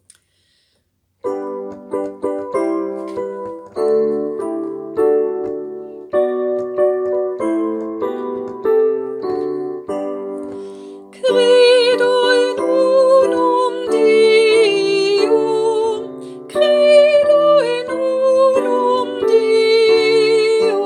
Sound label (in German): Credo Kehrvers alle Stimmen; Rundfunk 2020